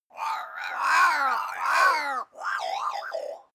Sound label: crows abstract; natur tiere; voegel